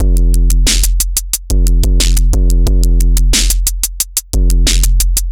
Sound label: Electronica Drumloop